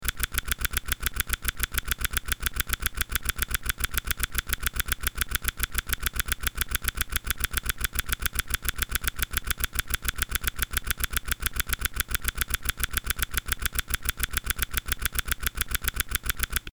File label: Reel Spin; Chic Town